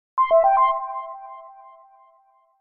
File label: Lg Sms